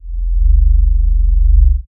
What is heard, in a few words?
low warm pulse; internal